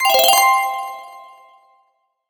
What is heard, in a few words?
big win; Beauty Fruity; wazdan; ultrafast